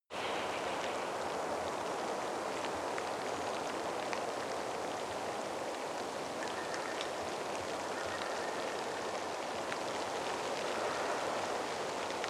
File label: forest rain light birds